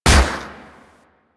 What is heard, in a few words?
Akimbo Pistol Shot; Grineer